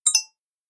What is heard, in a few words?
notification; samsung Aleart